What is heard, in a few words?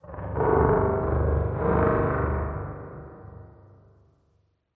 amb gen low metallic, ambient; Half Life Model Pack